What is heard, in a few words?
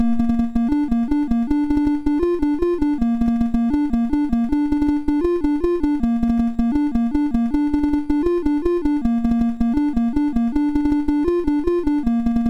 sid, MOS6581R2; Donkey Kong 1983